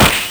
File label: LSDj, Nintendo Gameboy; 300 Drum Machines